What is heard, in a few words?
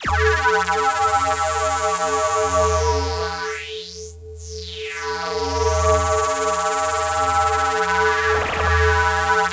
RING ZAP; Distored Reality